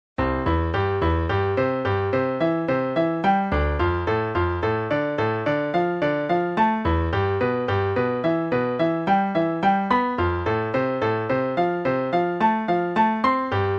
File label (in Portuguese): arpejo